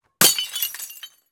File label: glass break champagne bottle; d50; Sonniss GDC